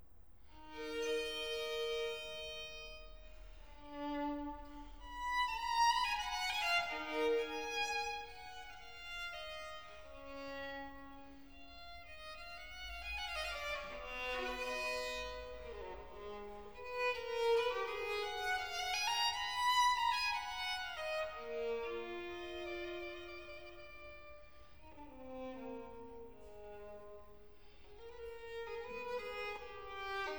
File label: Concerto in G major, Adagio; Bach; Brandenburg Concerto No. 3; BWV 1048; II. Adagio; Blythburgh